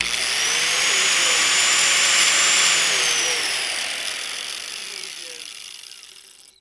Disc Sander Short

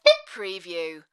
Sax Beep

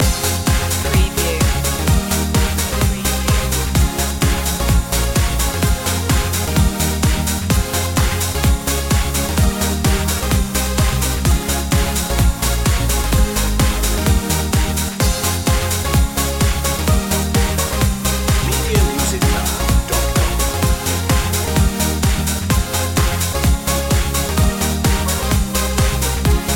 turn up the music loop

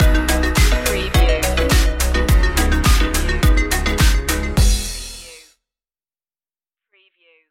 sunfruits stinger

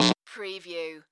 Electric Zap Short

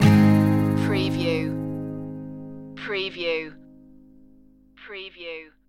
home is where the heart is stinger

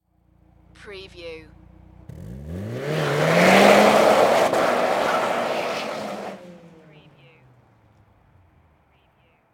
NISSAN SILVIA EXHAUST TAKEOFF

